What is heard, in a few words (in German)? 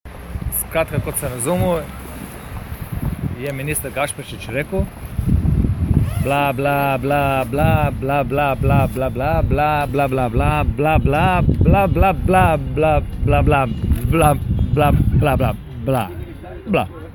Glas